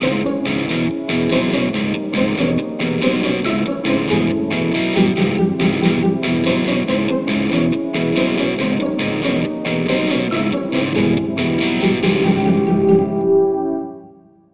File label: Bari Sax